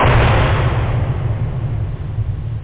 gnab, explosio; da capo vol1

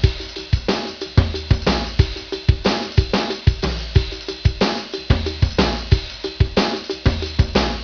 rock

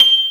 grand piano G6; Star Trek JR Audio

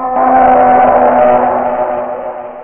Creature call; Curse of Dragor

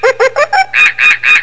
monkey screech

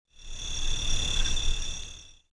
CRICK, nature

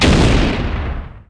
Boom; Falcon 40

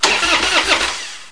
klick; ignition; BOOST

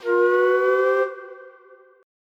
zoom up

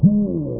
"hyah"; captain custard

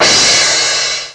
cymbal; PUZMANIA

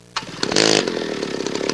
starting; Easy Personal Web Page

